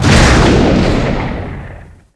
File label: fire; zaero; weapons